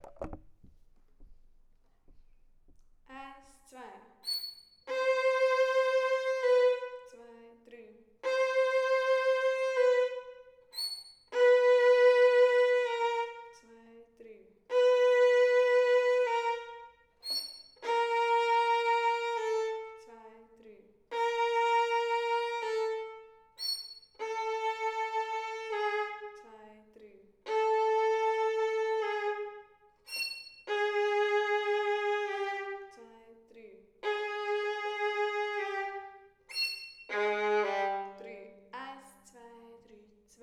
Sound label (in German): Violine; Kinderorchester